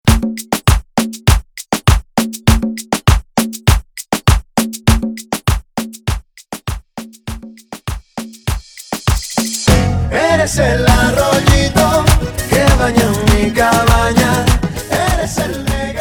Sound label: Intro Chorus 100 Bpm